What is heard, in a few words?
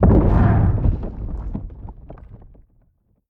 farmhouse shake thump